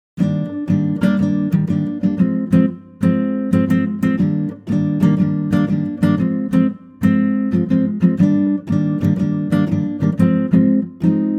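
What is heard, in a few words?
acoustic guitar Eb; key - Eb - vocal range - Bb to Eb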